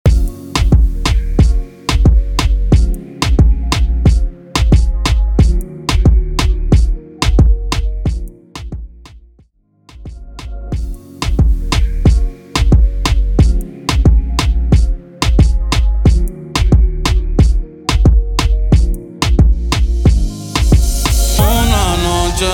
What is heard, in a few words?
Intro Outro Dirty 90 BPM Dancehall